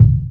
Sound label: BDRUM; E-Lab; X Static Goldmine 2; akai